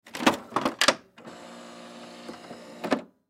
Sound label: Printer Copy Machine Open Door Digital Scan Wipe; Office; Blastwave